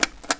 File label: CLICK; RedCat en de Spannende Stedentocht